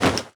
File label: foley drastic